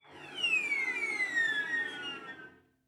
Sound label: Cello Seagull Effect; SoundsCrate